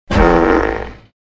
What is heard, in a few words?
tractor fart; Cars; tractor tip